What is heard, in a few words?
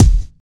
shiny kick drum sound e key; kick drum samples